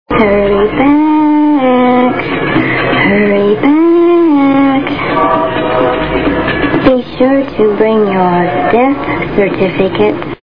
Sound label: haunted